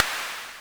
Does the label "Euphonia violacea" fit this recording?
No